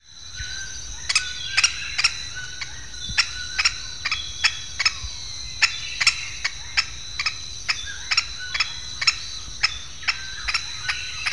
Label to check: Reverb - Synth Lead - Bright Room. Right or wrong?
Wrong